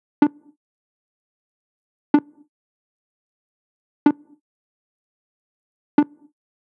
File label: House 125 Perc; Drums; Loops; Drum Construction Kits; Ghosthack; Drum Mega Bundle; Drum Hero 5